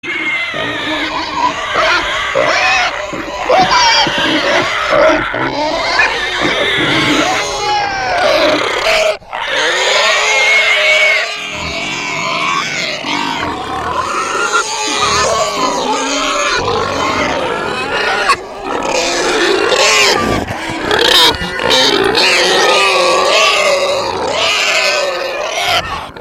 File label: crazy pigs